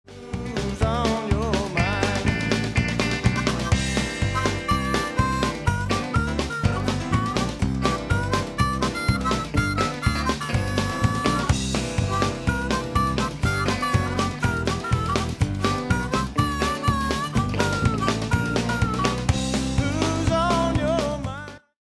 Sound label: harp good